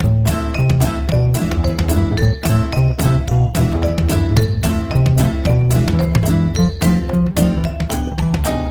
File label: music